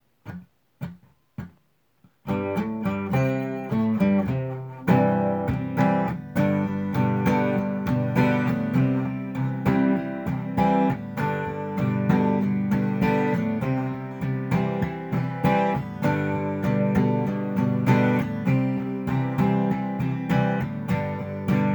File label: acoustic; brick and mortar; hitch n post and more